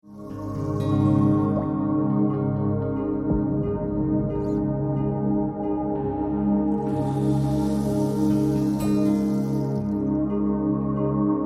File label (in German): Entspannungsmusik